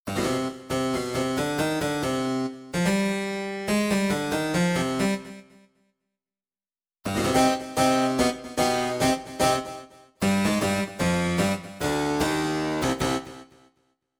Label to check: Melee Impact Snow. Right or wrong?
Wrong